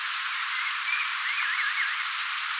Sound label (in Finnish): erikoinen sae ollakseen keltanokkarastaan sae; keltanokkarastaslinnun; ote edellisestä: erikoinen säe